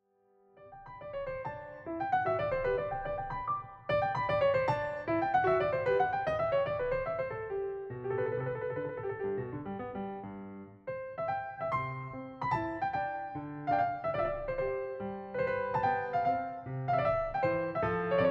Keyboard Sonata in C Major Allegro, piano; No. 36; Hob. XVI, 21; haydn

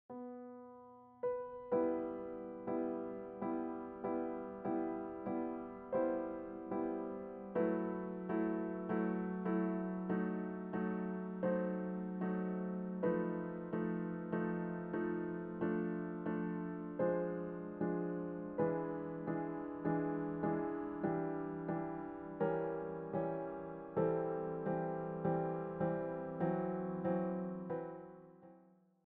Prelude in E Minor, piano; classical masterworks vol 1

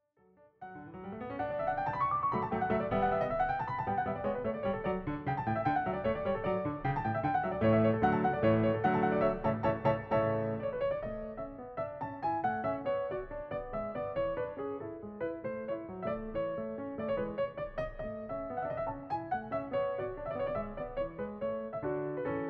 Piano Sonata in D Major, Allegro con spirito; mozart; piano sonatas no 9-11

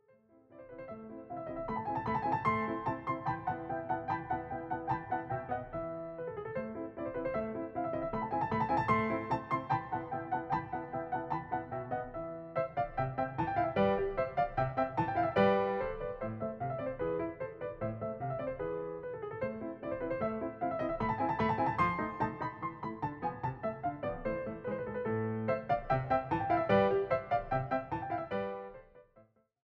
Piano Sonata in A Major, Alla Turca (Allegretto); Piano Sonata No. 11; K. 331; mozart

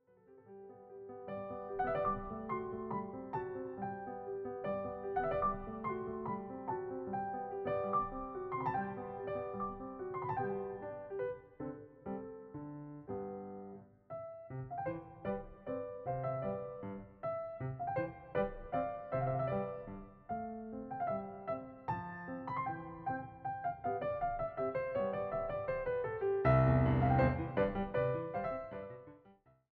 Piano Sonata in C Major, Allegretto; Piano Sonata No. 10; K. 330; mozart

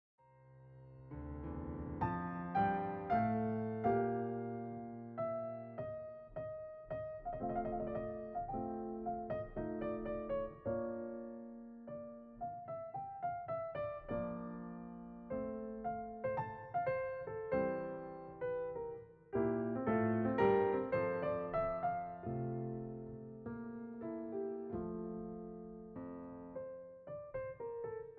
Piano Sonata in C Major, Andante cantabile; mozart; piano sonatas no 9-11

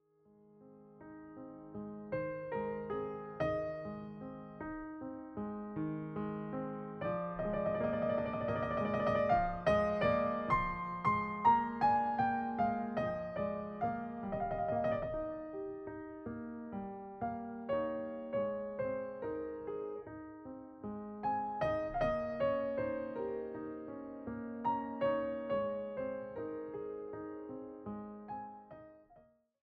Keyboard Sonata in F Major Adagio, piano; Keyboard Sonata No. 38; Hob. XVI, 23; haydn; Piano Sonatas 35-38

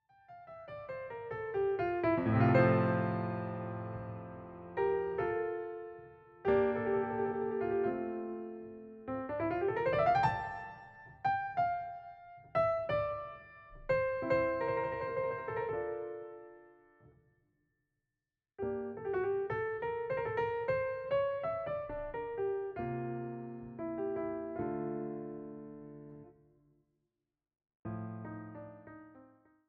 Keyboard Sonata in C Major Adagio, piano; No. 36; Hob. XVI, 21; haydn

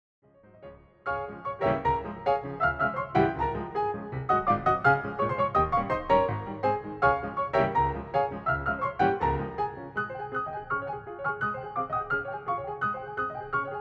Hungarian Dance in D-Flat Major- Vivace; brahms; 21 hungarian dances; WoO 1

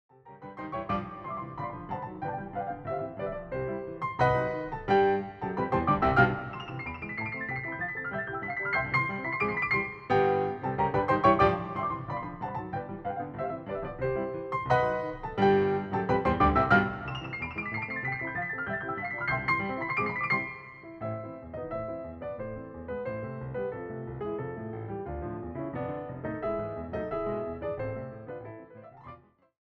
Hungarian Dance in A Minor Presto, piano; brahms; 21 hungarian dances; WoO 1

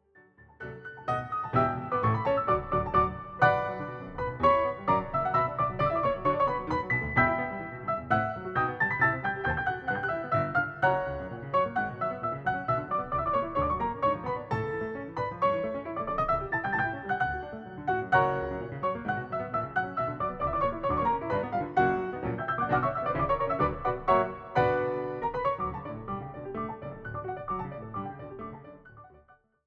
Hungarian Dance in D Major- Molto vivace; brahms; 21 hungarian dances; WoO 1

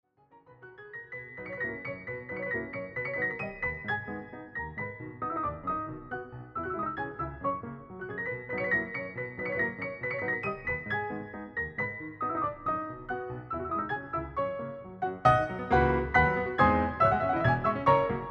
Hungarian Dance in B Minor Allegretto, piano; brahms; 21 hungarian dances; WoO 1

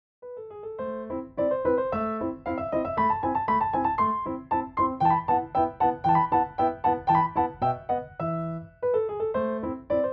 Turkish March, piano; classical piano favorites from the romantic period vol 1

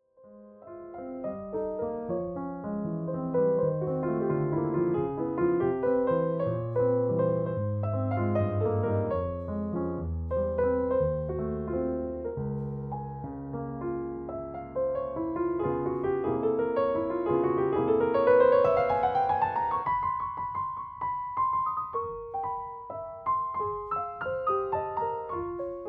Etudes de Concert in F-Minor, piano; S.144 No. 2; La leggierezza; liszt

